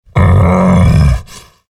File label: pain ability damage; visor; low health